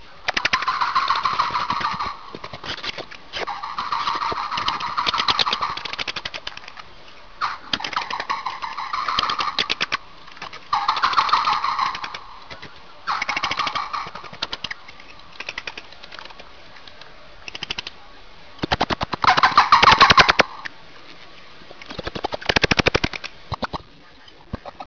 bats; railroad

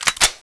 bolt pull; cstrike

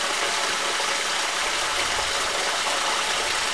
water drain; cstrike

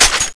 five seven; weapons; bufffiveseven; cstrike

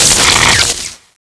teleport skill; cstrike

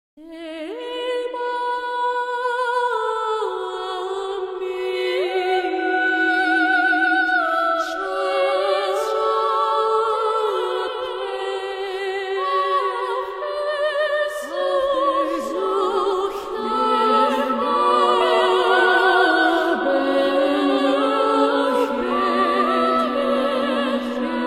start; kzra hulle granz cathedral

